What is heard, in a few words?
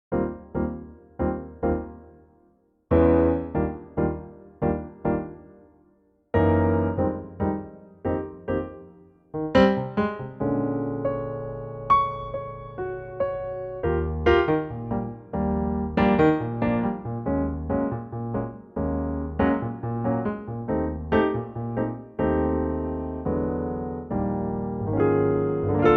Eb piano